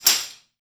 TRASH STIX; Spectrasonics; Liquid Grooves 1 & 2

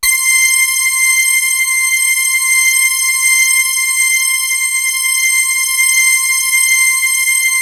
POPBRASS; MORPHEUS

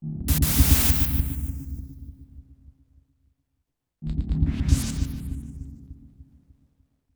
Machine Stack, Synth SFX; Sonic Foundry (Sony Creative Software); The Electronic Music Manuscript Collection

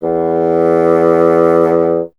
WND BSN E, Bassoon; Miroslav Vitous - Solo Instruments VOL-1

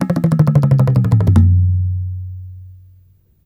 TABLA LO FILL, Indian perc; Spectrasonics - Heart of Asia CD2

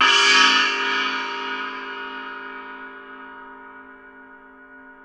NEPAL CYM, Asian Cymbals

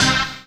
HOUSE, House Dance; 90 s Sample CDs; Best Service; Dance Mega House Dance

